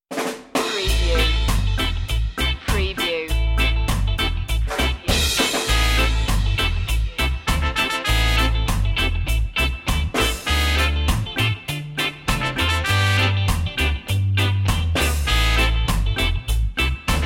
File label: rock steady rockin